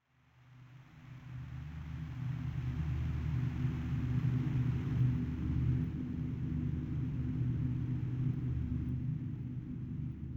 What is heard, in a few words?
Atmospheric Ambience; Starbrick Sound; Ambience Sound Library